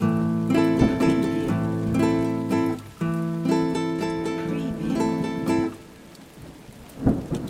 Guitar 6-8 Shekaste 80BPM Am, nylon guitar, broken rhythm; beatcraftpro